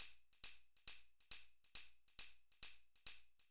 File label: Beat, Old Skool House, 137bpm; Belfast